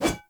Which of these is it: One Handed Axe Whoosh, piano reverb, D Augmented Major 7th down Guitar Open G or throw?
One Handed Axe Whoosh